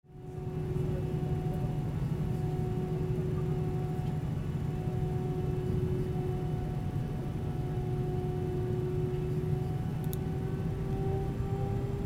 a319 jet cabin take off

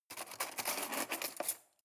cutlery cake forks drawer grab soft

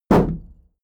shield sheet impact dent soft

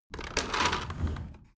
window roller shutter open soft single pull

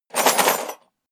toolbox handling rough rattle

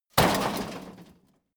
metal rock impact multi

